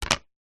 household plate plastic childs set down wood table